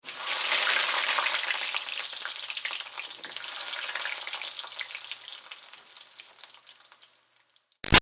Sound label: Food Sizzle Oil French Fries Splash Light; Household